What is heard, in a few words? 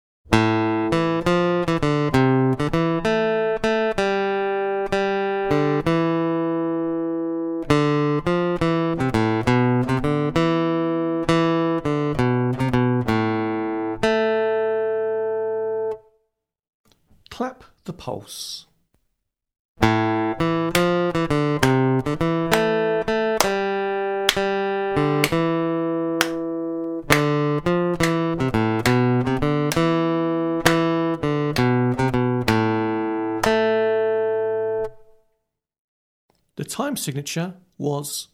Aural Rhythm Test, acoustic guitar; grade 6